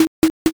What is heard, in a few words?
knock